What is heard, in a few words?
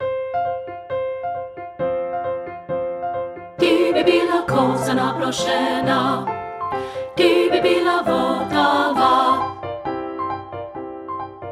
alto